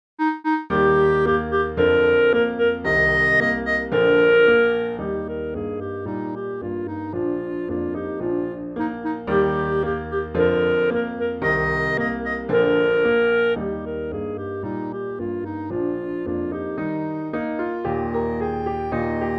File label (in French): ecossaise; beethoven ludwig van